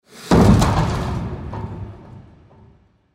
Hockey Check, Hockey Check Into Boards Glass Body Slam; SPORTS